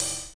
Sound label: Drums; alone in the dark 4